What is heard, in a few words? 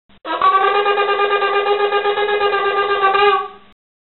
Teruah